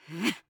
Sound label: Grunt Slow; Realm Royale; Hunter